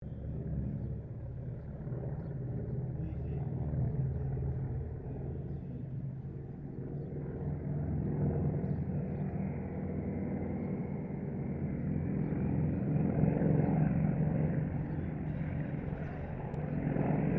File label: Ceres Winjeel Wirraway pass, stereo; Mixed aircraft squadrons; Airplanes; Vehicles